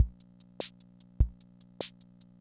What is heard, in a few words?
Kent K-220 Rhythm System Loop, drum machine